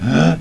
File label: AHH; surprised zombie growl